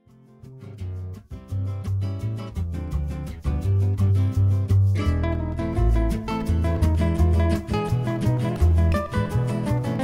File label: Gypsy